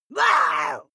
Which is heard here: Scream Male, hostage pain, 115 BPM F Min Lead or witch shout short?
witch shout short